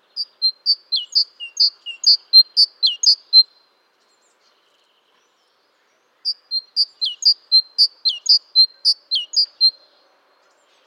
Mésange charbonnière

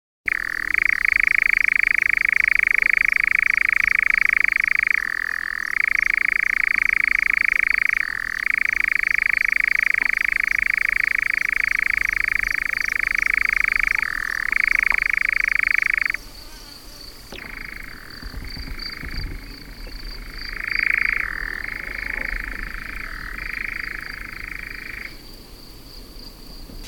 Engoulevent d'Europe

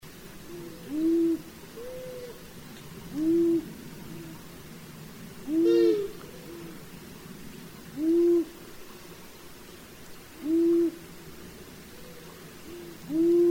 Hibou moyen duc

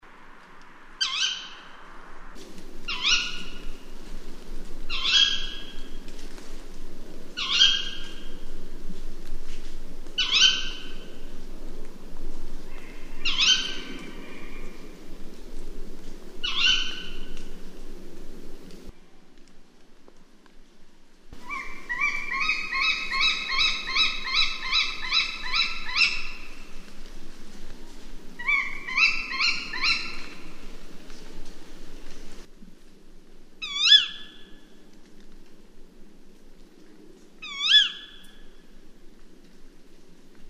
Chouette hulotte

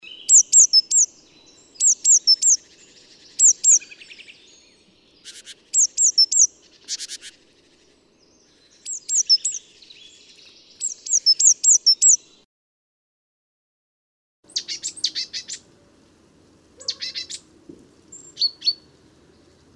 Mesange charbonniere cris

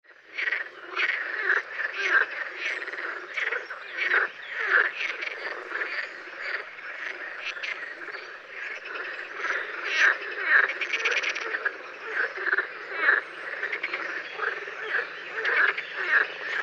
Grenouille verte

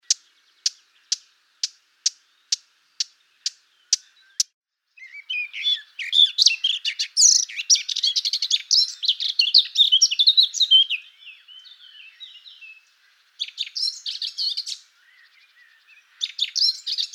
Fauvette a tete noire

